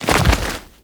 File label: Roll Forward Foley D; Enemies; Warframe; Teshin